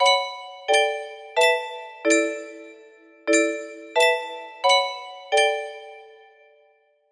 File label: melody; bigben